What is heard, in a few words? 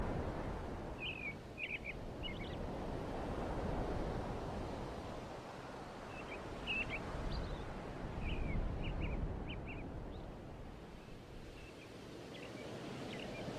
ambience; lightning speed; italy